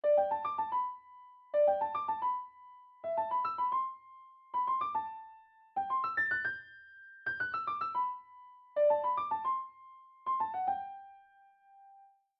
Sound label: Flute Theme; supernintendo; The Legend of Zelda - A Link to the Past